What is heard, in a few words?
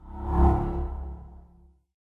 Spirit Mausoleum Attack Preload; Fallen Ones